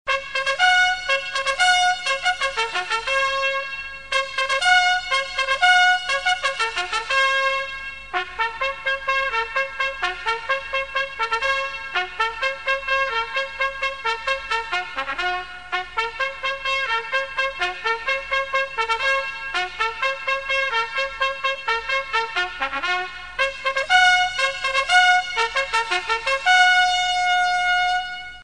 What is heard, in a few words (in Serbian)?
Vojna Truba Budjenje